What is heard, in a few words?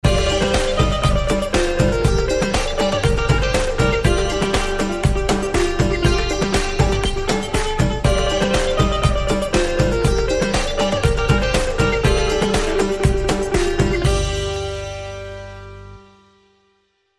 Bhangra